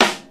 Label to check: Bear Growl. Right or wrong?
Wrong